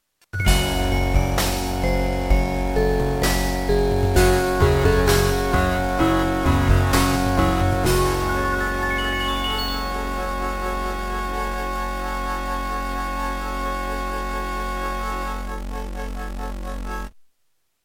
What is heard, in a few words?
Beepblaster 2.0 SYNTHMODE; sigil doom episode 5